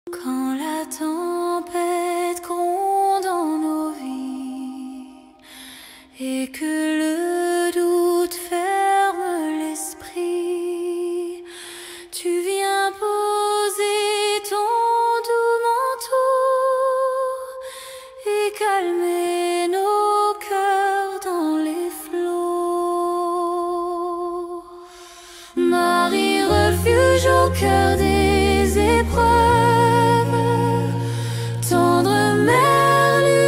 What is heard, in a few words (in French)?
Un chant à Marie; qui défait les nœuds pour libérer ta vie; Chants à la Vierge Marie